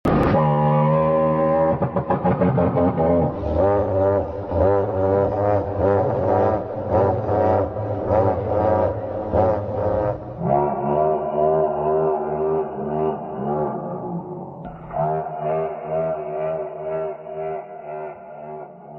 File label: t rex roar; paleosound